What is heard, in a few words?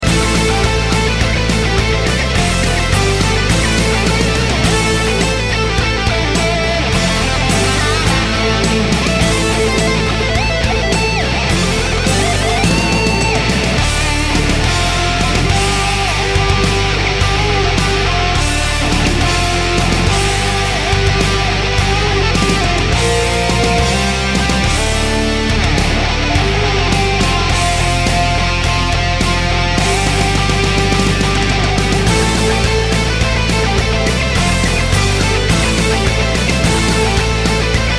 Loop Main Menu; santa rockstar